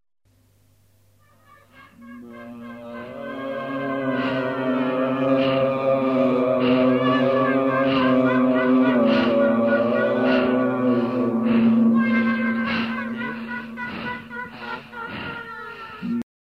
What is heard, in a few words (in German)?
stimmengewirr